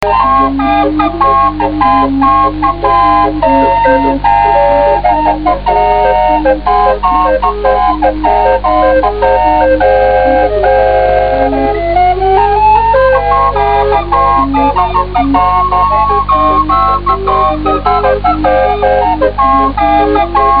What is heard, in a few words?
caliope